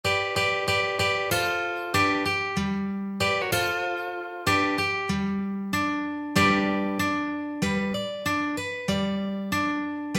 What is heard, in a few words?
Slide & Fingerpicking in Open G